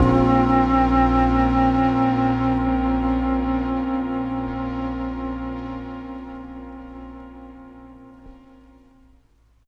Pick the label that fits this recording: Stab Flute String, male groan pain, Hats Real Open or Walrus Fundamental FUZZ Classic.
Stab Flute String